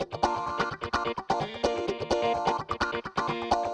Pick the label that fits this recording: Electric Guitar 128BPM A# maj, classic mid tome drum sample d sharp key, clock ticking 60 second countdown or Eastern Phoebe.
Electric Guitar 128BPM A# maj